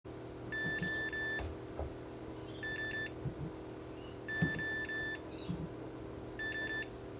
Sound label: error tune; tunes; PX4-Autopilot